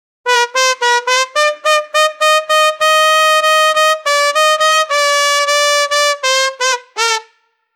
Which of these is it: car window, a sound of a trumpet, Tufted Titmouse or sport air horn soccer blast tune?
a sound of a trumpet